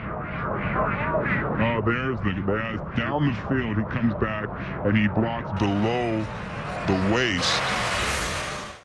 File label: Wah-Wah